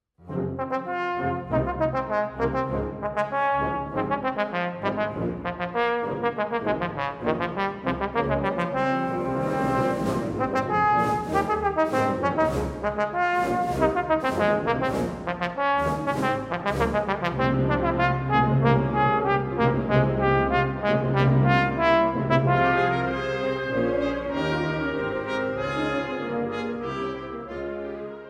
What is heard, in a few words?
Trombone Concerto Allegro